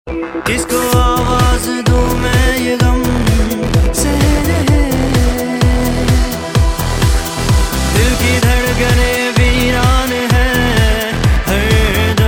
Maaaahiaaaaaaa

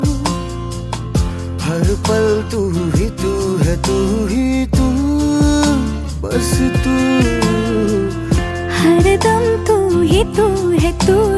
Romantic Song

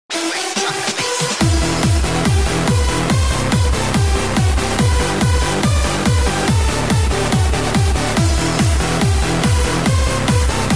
Flying High Remix

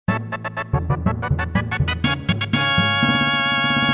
Fanfare

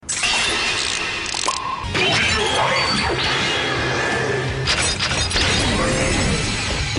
Drill Arm

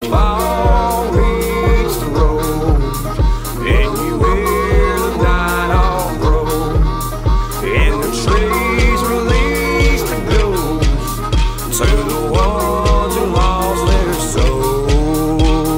Ghost

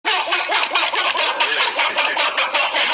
Throat punch

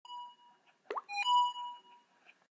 ding; Yahoo

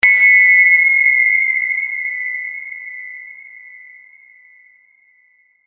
Glass Message Tone

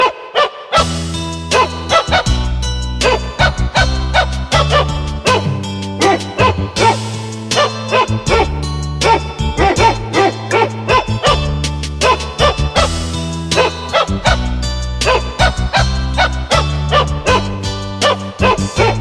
Dog Bark Music

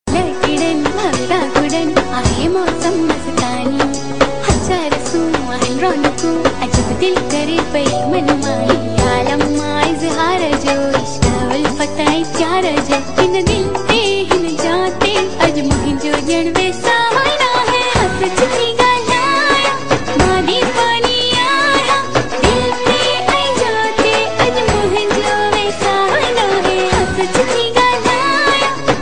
Sindhi Tone

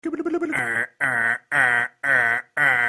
Clock

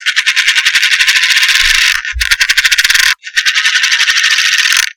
Coin Spin

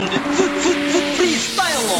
Short Sms

